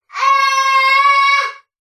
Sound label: human child boy 15 months cheer shout other room; zapsplat